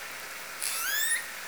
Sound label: call S30 or S35, OrcaCalls, SRKW; Alwin